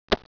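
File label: sling hit; Caesar 2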